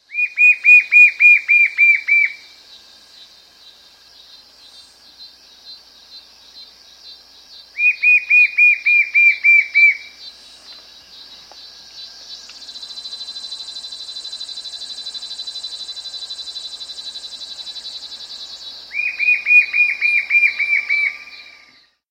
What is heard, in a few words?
White-Shouldered Fire-Eye; S. America